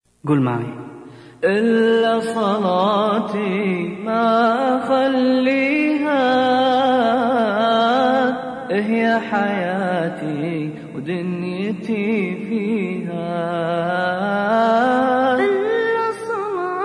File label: salati islamic song ringtone